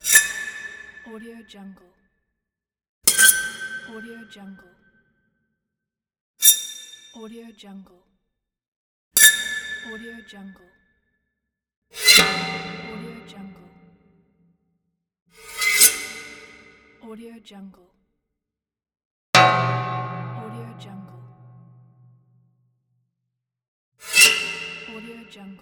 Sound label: medieval metallic sounds pack